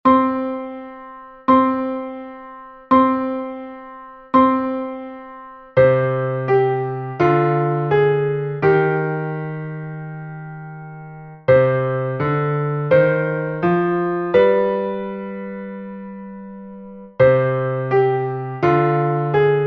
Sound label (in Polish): dyktando dwuglosowe; Lekcja 16